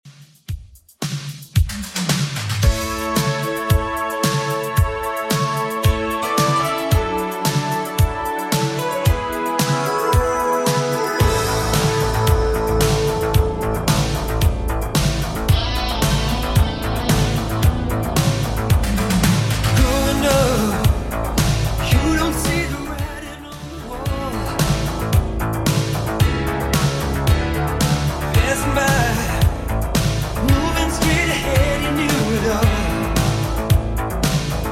80s Redrum 112bpm

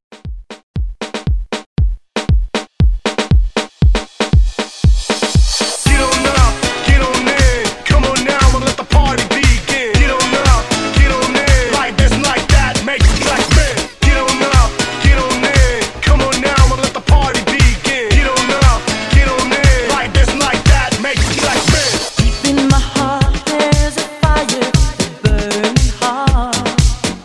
80s Hype ReDrum 117Bpm

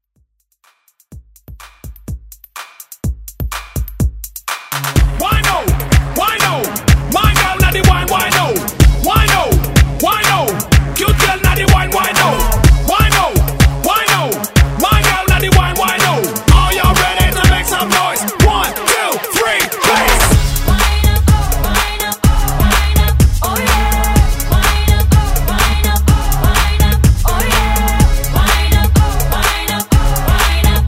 Throwback Hype

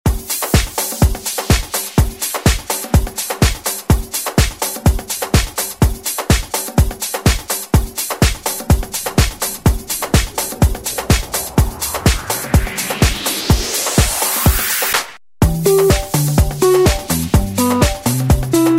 Pop; Deejay Hit; Redrum

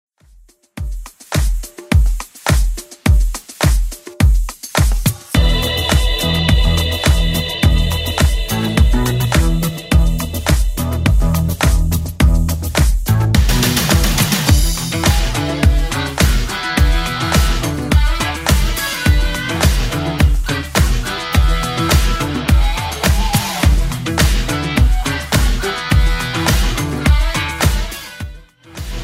80s Club 105 bpm CLEAN

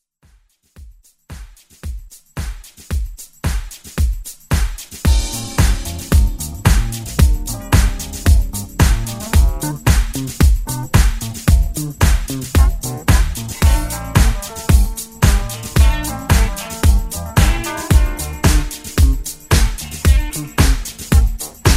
70s Redrum

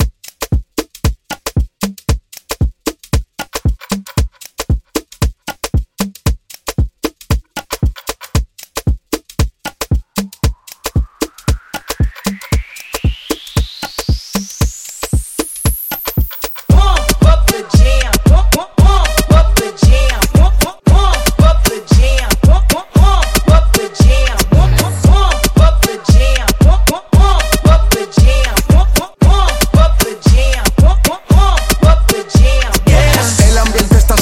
Latin Redrum Hype; Remixfreakz

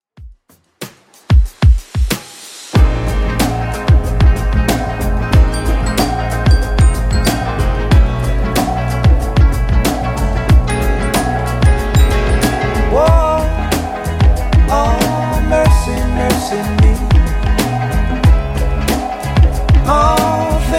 70s Redrum 93bpm

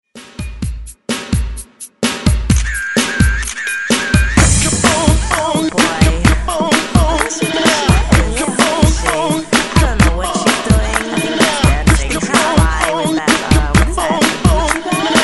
Hype Re Drum 128 Bpm

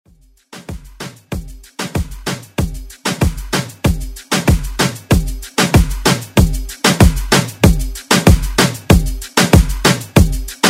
Moombahton Redrum

